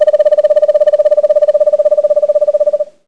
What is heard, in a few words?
Screech, Screech Owl